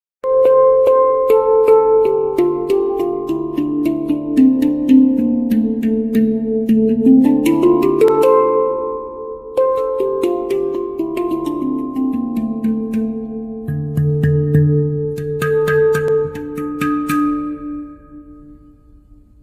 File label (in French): 10 notes bleu, handpan